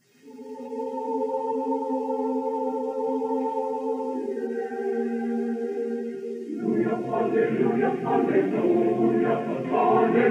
Brazilian Psalm 'Alleluia' (Christian Acappella), Choir; USC Pantheon Choir -Pgh